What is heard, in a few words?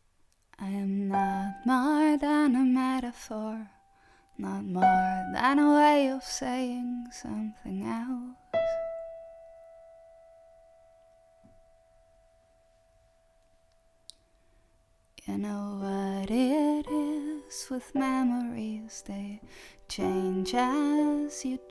breath